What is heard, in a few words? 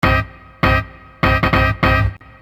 Hit Loop, Hip-Hop; Construction Kit; Sonic Foundry; Hip-Hop Street Beats Construction Kit